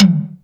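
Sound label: mid tom, drumtraks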